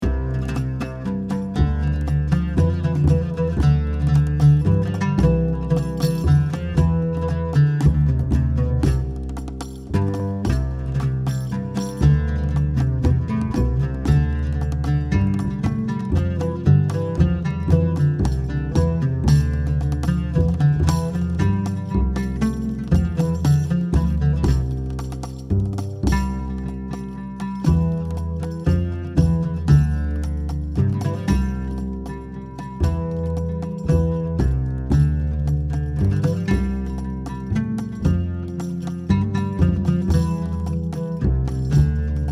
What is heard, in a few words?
Jingle